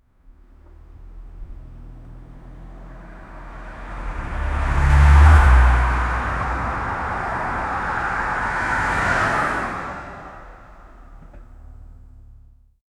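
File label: porsche 911 car acceleration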